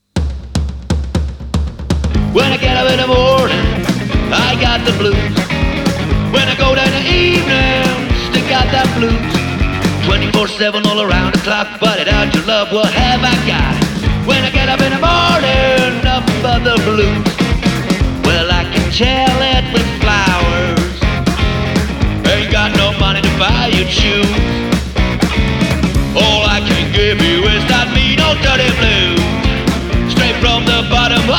Blues

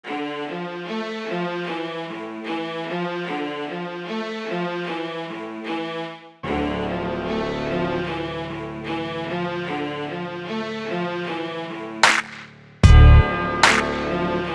Beat

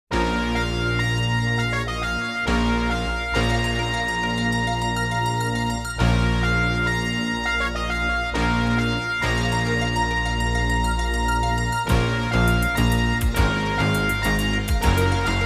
Fanfare for a Robot

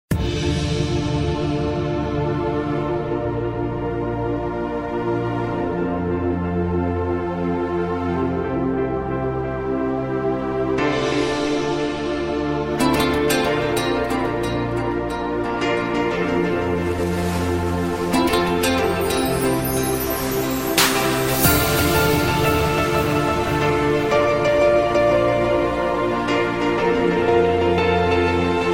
Chill Out Music, The Ocean

